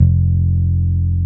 BASSES, Roland

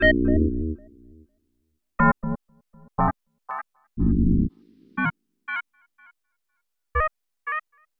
Tape Arp

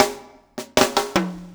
SLICK

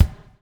BUBL, BUBBLE; Spectrasonics - Backbeat

